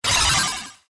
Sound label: power up